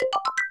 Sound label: Sent Mail, Notification; Mac; microsoft office mac